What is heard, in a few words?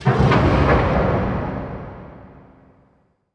JETTISON, PERC